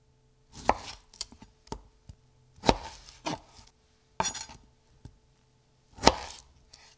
Chopping Lime; Cooking

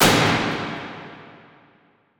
Impact Strong Metal Falling Fast; Impacts Cinematic

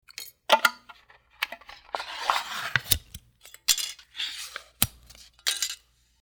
Metal Can Opening

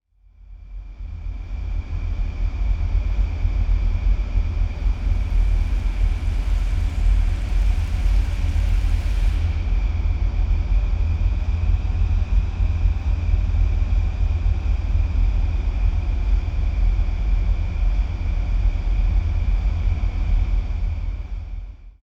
Athmospheres

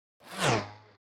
Sci Fi Ultra High Swirl; Whooshes

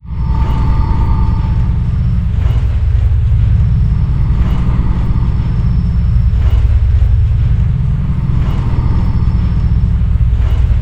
Digital Ambience

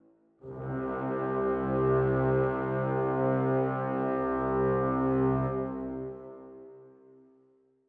Dramatic Brass

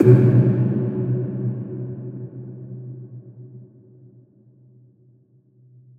Malfunction

